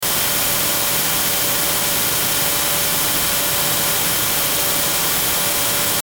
Tuner Radio Static; Communication

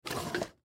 Drawer Wood Desk, Drawer Wood Desk Open Fast; Office; Drawers; Household; Blastwave

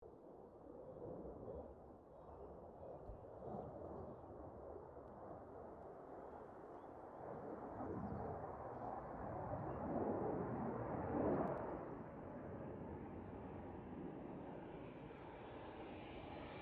AIRCRAFT CA-27 SABRE PASS STEREO; CA-27/F-86 Sabre; Airplanes

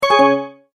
multimedia tone, Goodbye

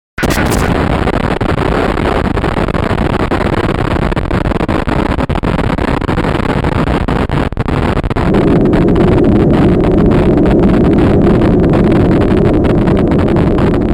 Bed Rumble Fuzz Glitch Hum; 5.1 STEREO MIX; Blastwave

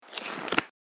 Book Fast Flicking Pages; Foley; Book Sound Effects; Andrew Fleming

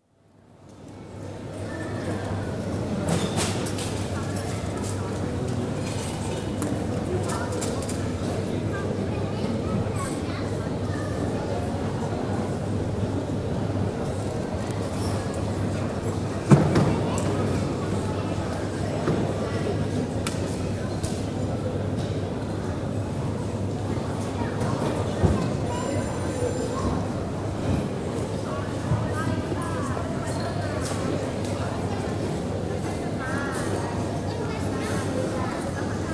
Hong Kong: Regent Hotel coffe shop; Restaurant; BBC

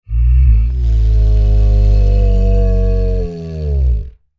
Creature Large Moan Rumble w Breath; Monsters; Sound Effects:Horror; Big Room Sound

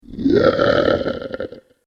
The Giant Lizardman, monster sound; fantasy; Andrew Fleming

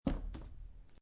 Playing Cards Tap Deck Of Cards On Felt; Casinos; Lynne Music

